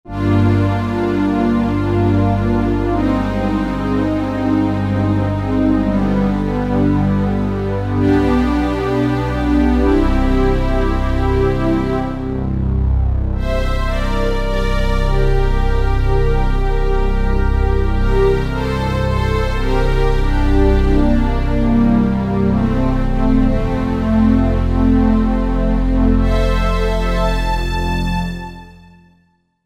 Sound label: Analog Pad, Korg TR-Rack